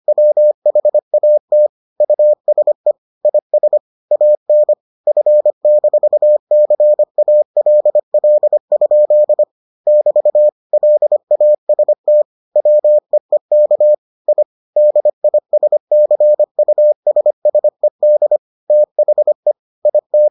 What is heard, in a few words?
morse